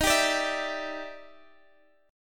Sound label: E Minor 7th D sharp; Em7/D#; strummed